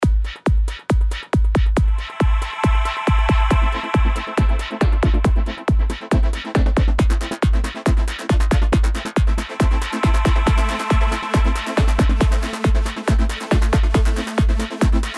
Trance; RP Predator

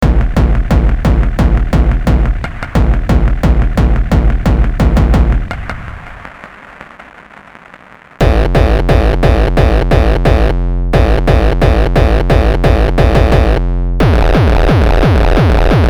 Punch-BD; RP Punch; Z-oid Bank

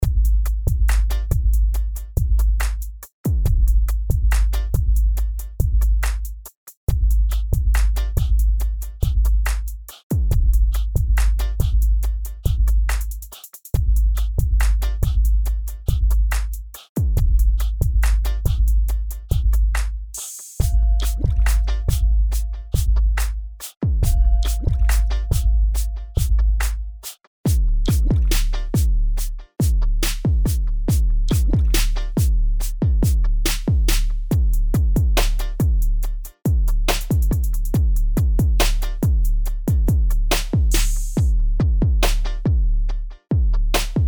Punch Hip Hop; Punch HipHop 01 pr23

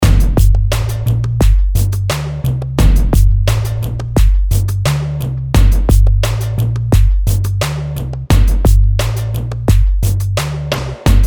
Punch Film TV

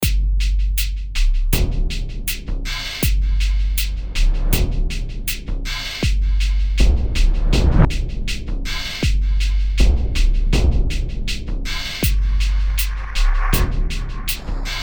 Punch Film Score & TV; Punch Filmscore & TV example 6

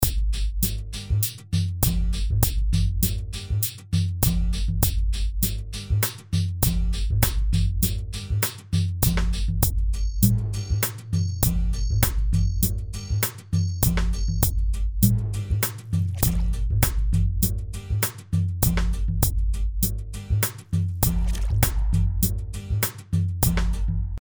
Punch Film TV

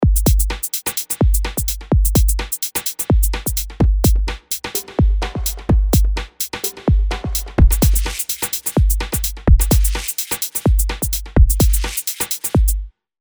Dance; RP Punch Dance

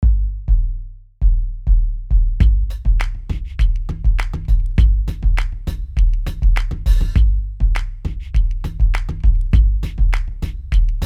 Sub La Kick Frame

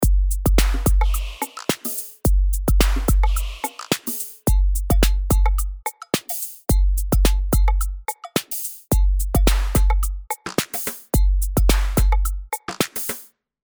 Hip Hop; RP Punch; Punch Hip Hop example 6

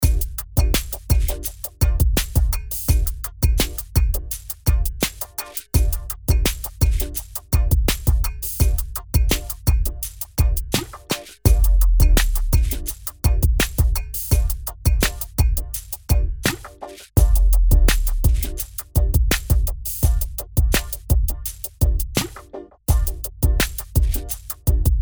Punch Hip Hop

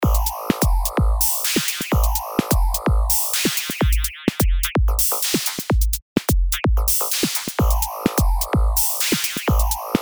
Dance; RP Punch Dance

